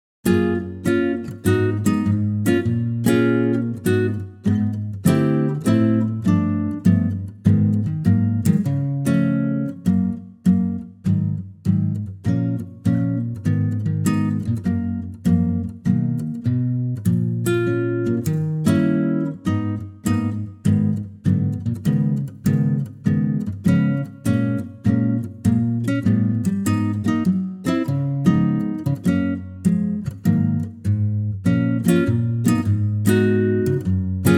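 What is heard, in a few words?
Gb acoustic guitar; key - Gb - vocal range - Ab to Gb; acoustic guitar arrangement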